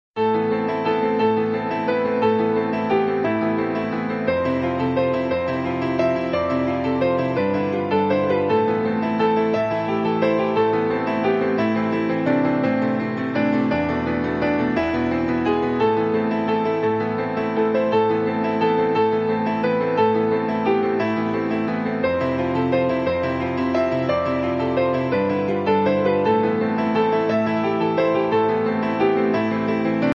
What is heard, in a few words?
The Piano